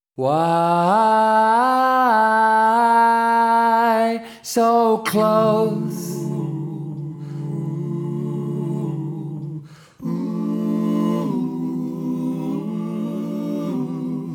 Orchestral Version

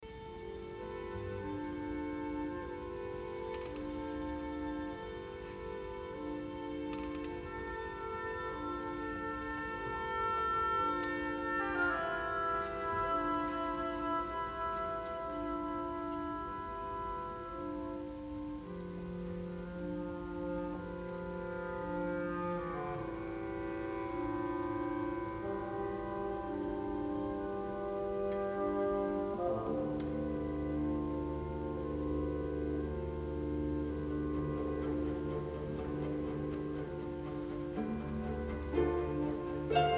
Orchestral Version Live

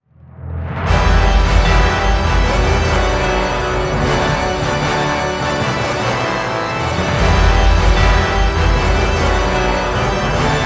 Orchestral Version

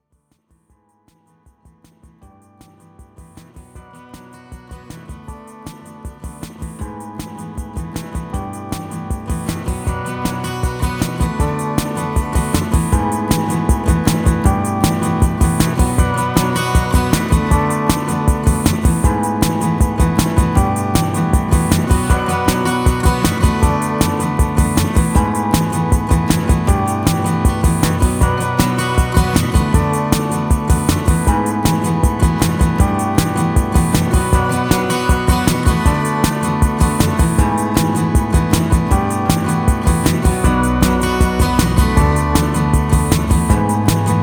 Instrumental

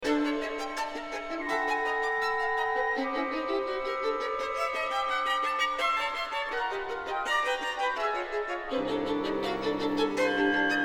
Violin Concerto

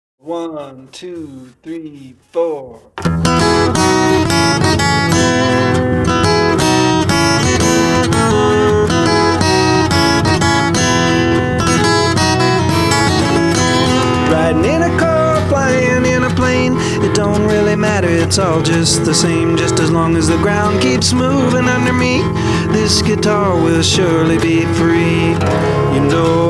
Twang Guitar; Hotel 4-Track, April 21, 1972

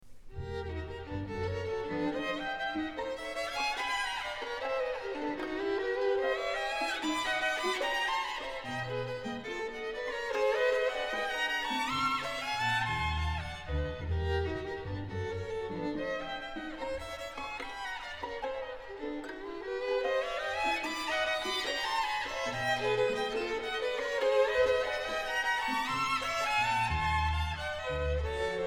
Violin Concerto, Allegretto

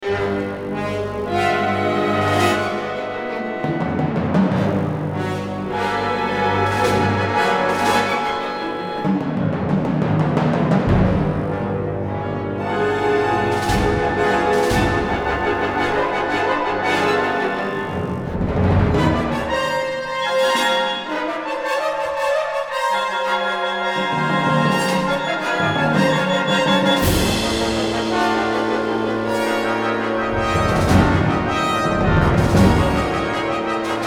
Violin Concerto, Allegro

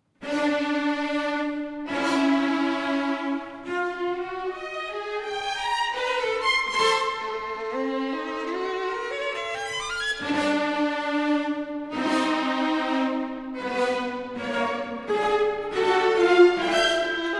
Violin Concerto, Coda. Maestoso

